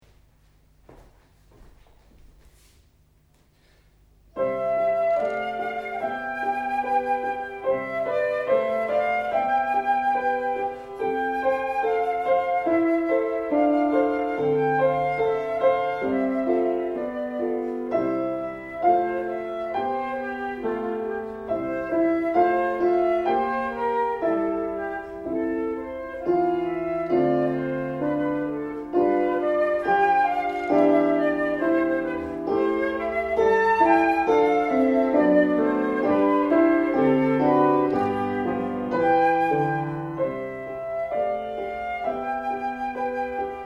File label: flute humming